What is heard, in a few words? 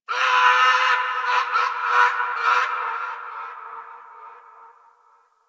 Distant Zone Four Creature; Warframe; Ambience; Eidolon Plains; One Offs